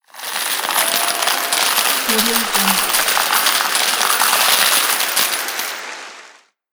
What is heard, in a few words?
winning money